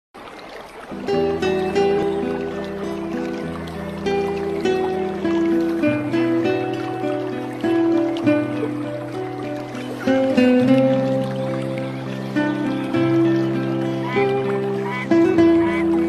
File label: music